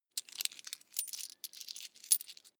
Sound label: coins clatter soft